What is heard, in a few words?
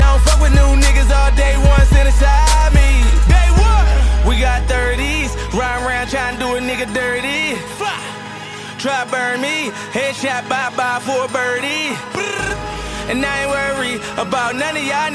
Ringtone